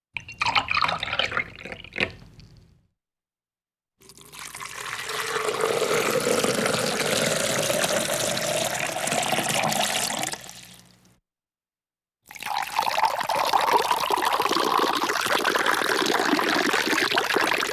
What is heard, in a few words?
Pour Pour Liquid Into A Glass Water Pouring; Electa Quinney